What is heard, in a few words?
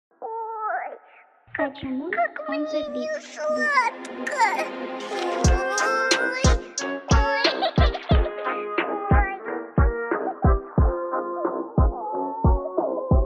trap remix; маша и медведь